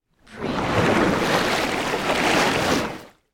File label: Water Movement Single; Sound Effects Bible